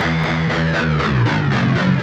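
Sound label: Guitar (metal); misc; Sonic Foundry (Sony Creative Software); Ugly Remnants VOL-2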